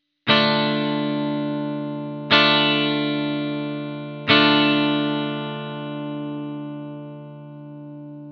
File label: chord d minor, guitar